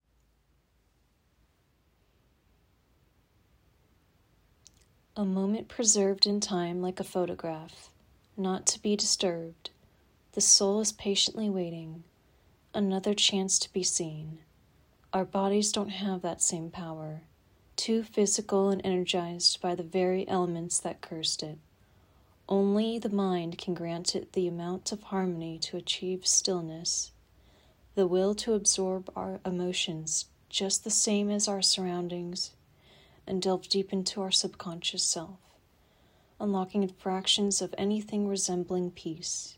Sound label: Stillness